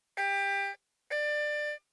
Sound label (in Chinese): 六角高胡 空弦音 自然衰减 力度中强